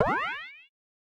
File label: shield; starfighter